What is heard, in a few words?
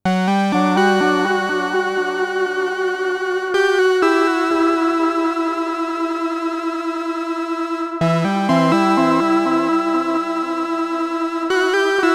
delay moog